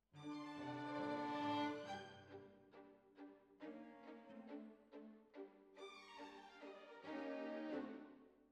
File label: Flute